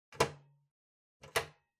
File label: Ceiling Light Pull